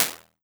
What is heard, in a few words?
flutter